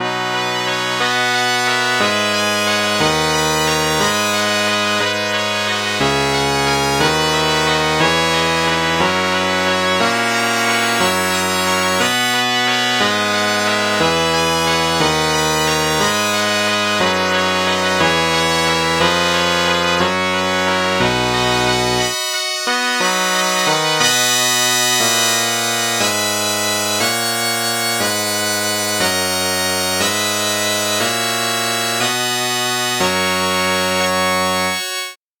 trumpet

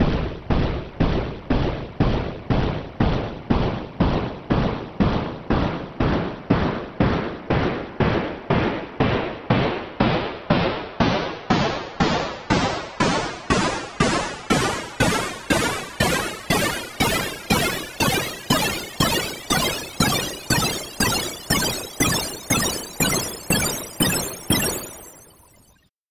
gun shot tonleiter

